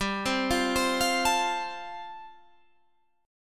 G 7th Suspended 2nd Suspended 4th G, Guitar Standard; arpeggiated; capo 9; chordshape